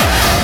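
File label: hard bass drums; Bazzdrumz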